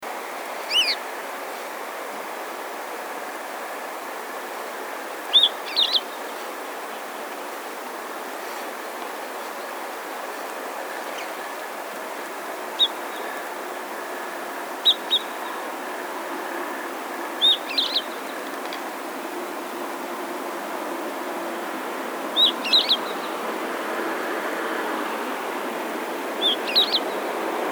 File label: Red-fronted Rosefinch Carpodacus puniceus puniceus; Ladakh